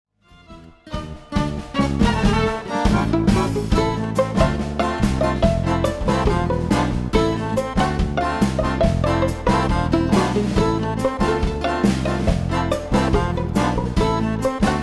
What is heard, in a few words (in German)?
Violine